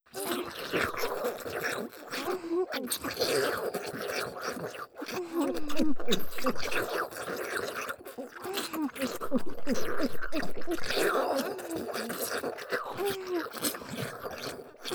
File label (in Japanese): ひたすら激しくベロチュー＆フェラループ